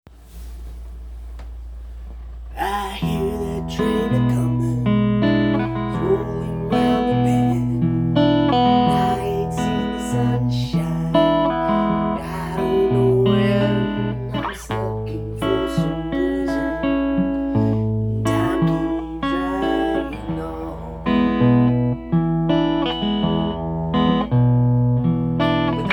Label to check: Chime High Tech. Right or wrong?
Wrong